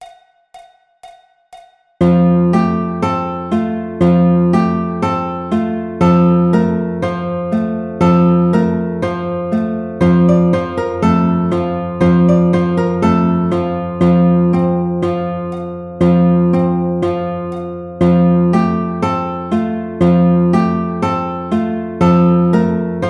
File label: Frere Jacques Alto, alto guitar